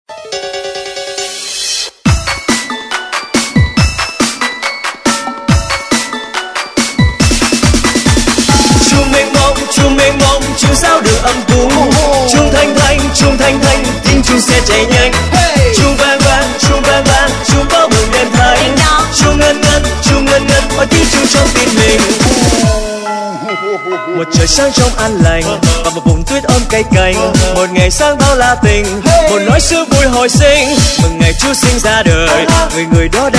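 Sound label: jingle bell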